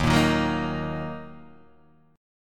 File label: D sharp Minor, Guitar, Half Step Down